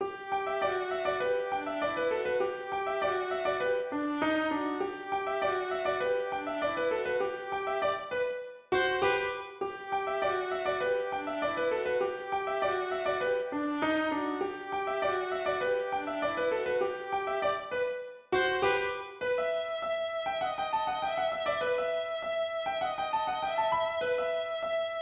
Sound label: contra dance; Pig Town Fling